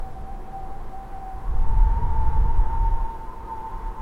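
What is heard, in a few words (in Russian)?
песня стекла прозрачная мелодия, сквозняка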